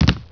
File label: JUMP FOOT